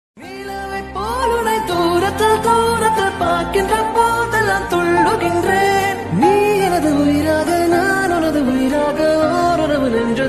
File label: love feeling song